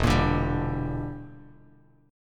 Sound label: E Minor 7th Flat 5th E down Bass Standard; strummed